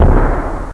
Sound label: running obstacle; zsdx